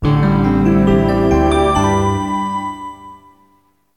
commercial

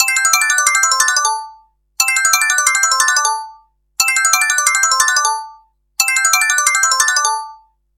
ring

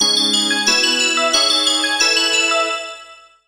White Alarm

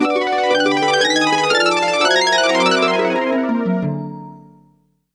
alarm

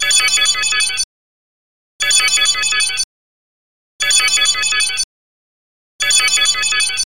Vibrate

